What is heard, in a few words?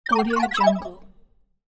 super level down